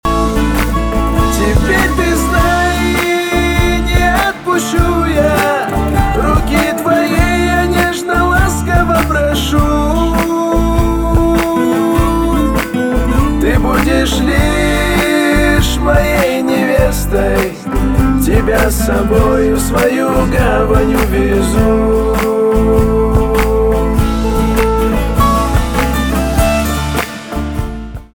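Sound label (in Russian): moia gitara, my guitar; на девушку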